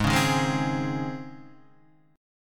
Db Major 7th Suspended 2nd Ab

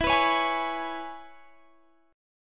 E 6th, down, Guitar, Standard; strummed; JGuitar

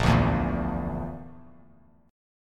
A sharp Major Flat 5th, Bass Standard; strummed; JGuitar

